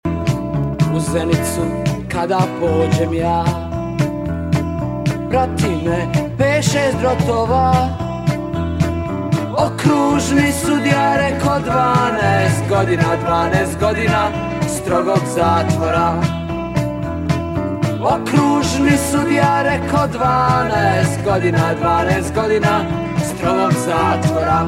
Blues

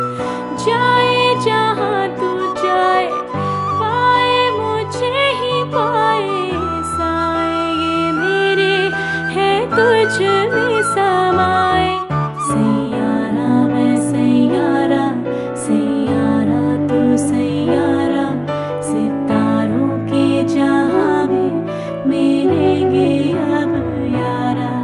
Nice Female

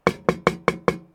Knock Knock

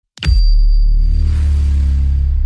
menu ping; Doom

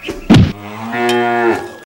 Cow

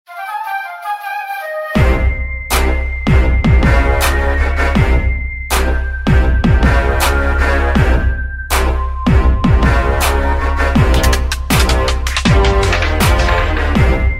beat

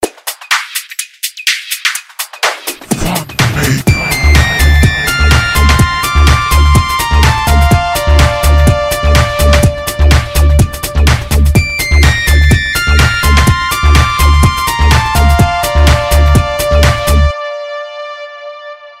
Hypnotic Tone

